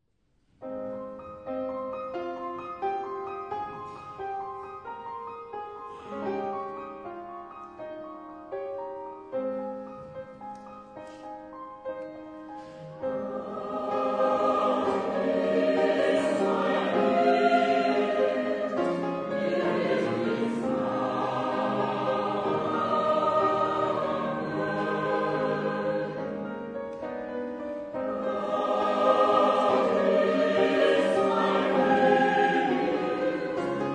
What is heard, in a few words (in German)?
chormusik